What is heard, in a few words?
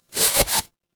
paper fabric fabric